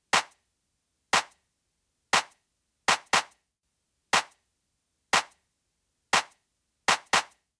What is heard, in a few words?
Clap, Dancefloor Pop; Remixlive